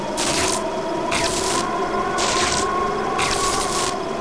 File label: lance field attack charge; cstrike